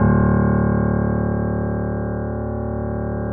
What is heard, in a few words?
D 0, Keyboards Acoustic, GRANDP ST; USB Soundscan vol.17